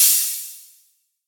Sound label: trebly open hi hat a sharp key, open hi hat sound tuned to the A# note